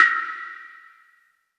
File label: snare woodverb; Sonic Foundry (Sony Creative Software); Ugly Remnants VOL-2; Beatstuff